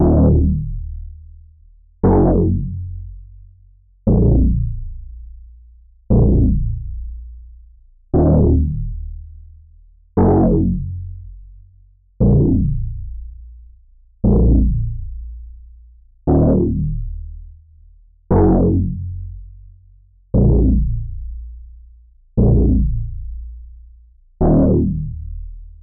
118 BPM A# Min Bass, Hip Hop; Melody Loops; Loop Stems; Cymatics; Nueva

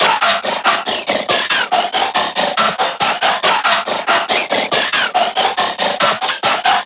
FX 140 Silder; Riddim; One-Shots; SFX; Ghosthack; Riddim Essentials Volume 2

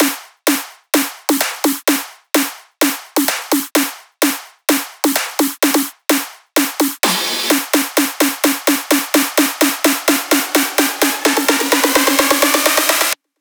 House Buildup Drums 128 BPM; Loops; Cymatics; House Starter Pack

